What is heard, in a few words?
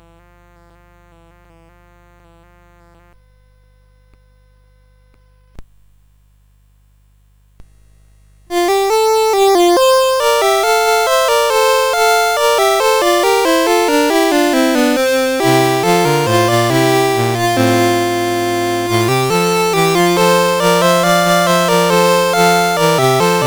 CSG8580; CSG8580R5